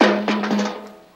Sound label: Bucket Metal Drop; barrels buckets & cans